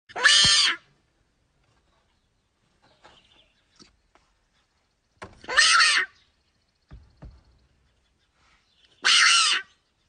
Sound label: hyrax screaming; audio meme